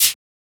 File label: Shaker; FLPRO